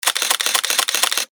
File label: camera autowinder